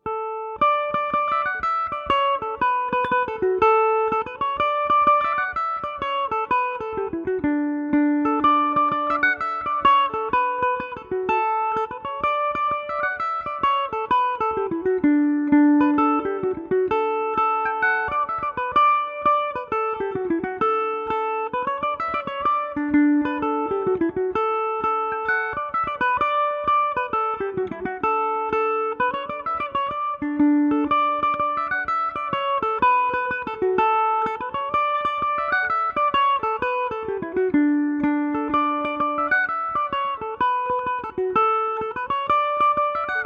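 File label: D major, Irish mandolin; What Ails You?; Revelation Jaguar electric mandolin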